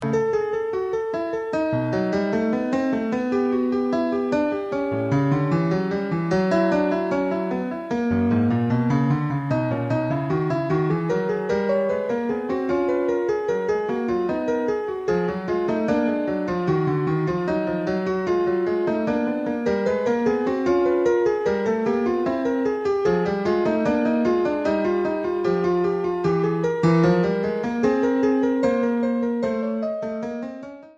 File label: baroque